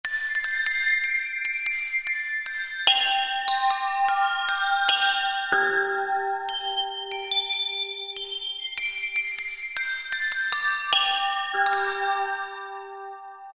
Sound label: Rama Cymbals; World; Roland SR-JV80-05